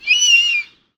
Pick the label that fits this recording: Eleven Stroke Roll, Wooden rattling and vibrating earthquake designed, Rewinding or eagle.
eagle